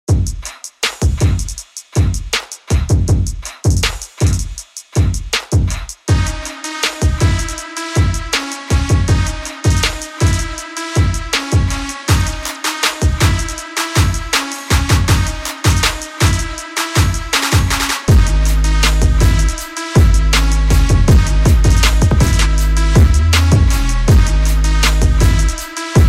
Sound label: Hip Hop